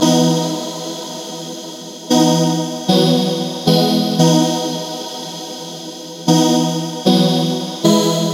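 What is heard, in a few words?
115 E major Synth Whispers; Synths; Zero-G; Future Wave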